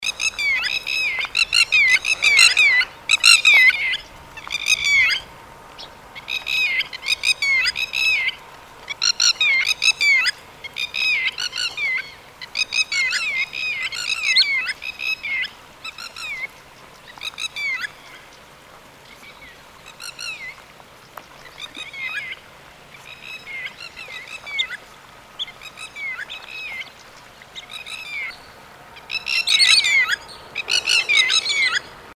chibis ukrashennyy golos

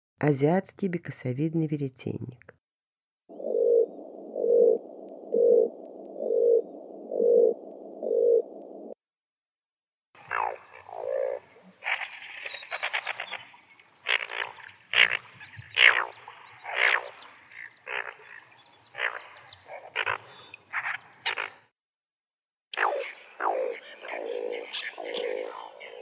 aziatskiy bekasovidnyy veretennik golos

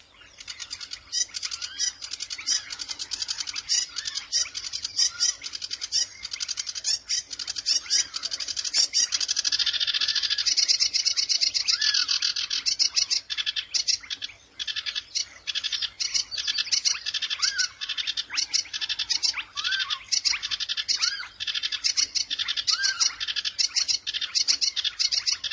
kamyshevka barsuchok golos

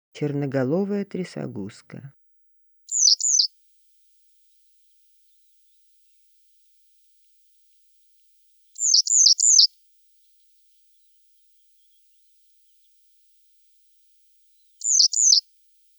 chernogolovaya tryasoguzka golos

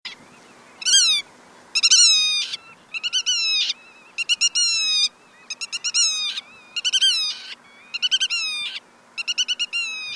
bolshoy veretennik golos

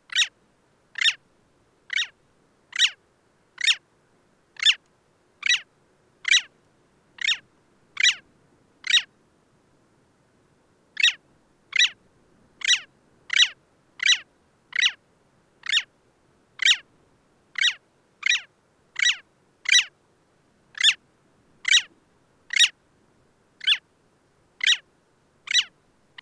dlinnohvostyy pomornik golos

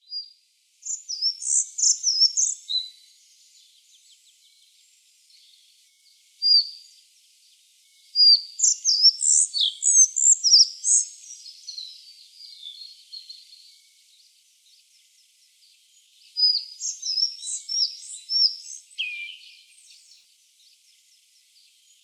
muholovka belosheyka golos

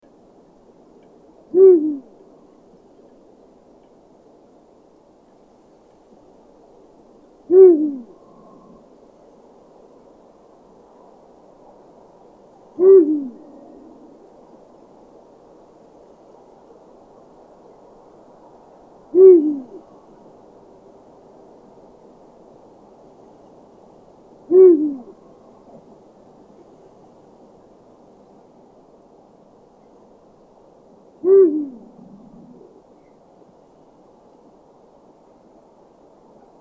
filin golos

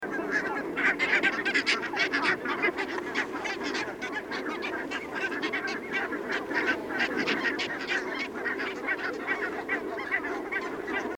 beloschekaya kazarka golos

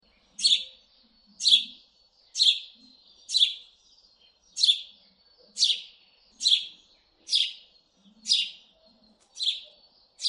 domovoy vorobey golos

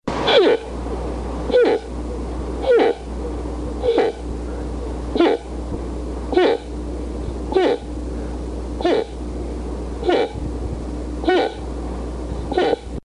losi golosa

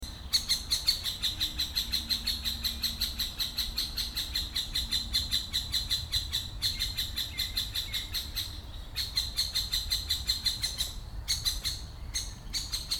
sredniy dyatel golos

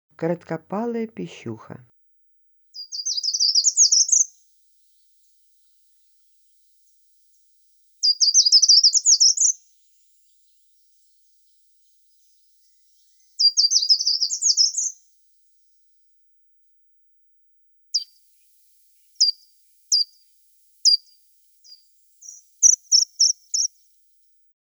korotkopalaya pischuha golos

